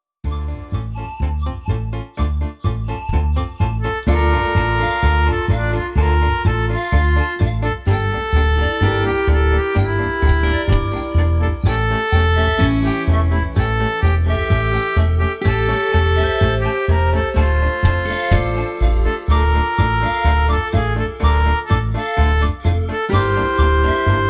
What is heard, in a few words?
oboe